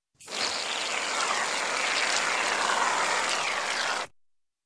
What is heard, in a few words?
Shaving Cream Foam Exiting Can, Barber Shop; Sound Ideas 2000 Series